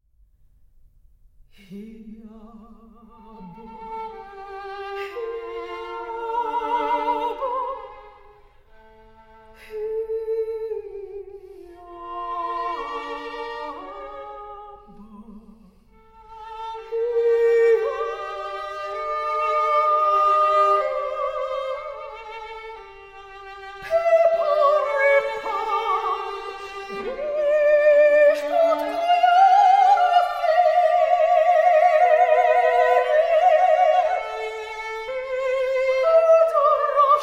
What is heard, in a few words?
duos for 2 violins; sorrow